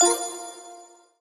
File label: pick clean; Geschicklichkeit; Bricks Of Egypt